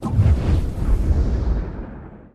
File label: Dragon Fire Whooshes; No Crackle Element X 10